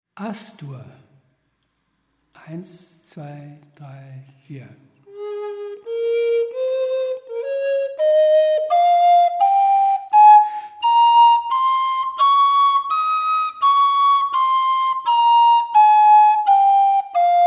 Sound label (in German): Altfloeten tonleitern